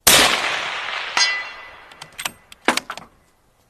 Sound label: Sniper target hit